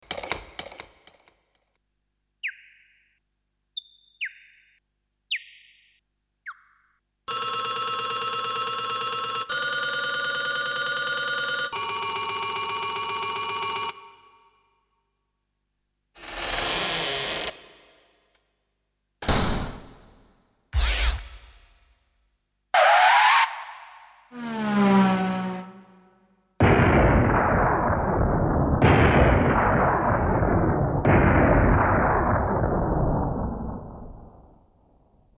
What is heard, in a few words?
Environment